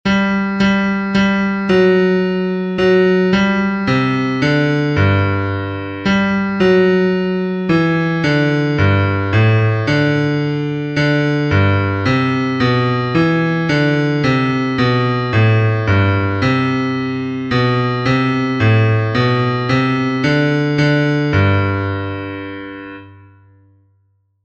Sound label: Bass